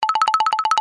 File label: cartoon run light footed fast wooden; zapsplat